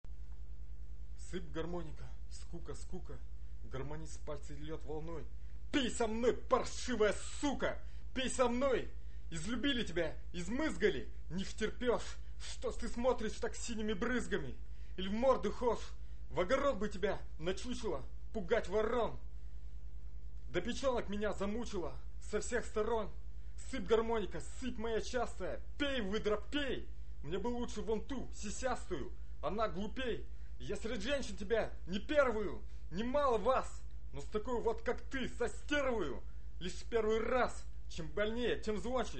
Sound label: Garmonika